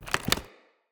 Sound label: Mockery Foley Melee Swing; Mockeries; Characters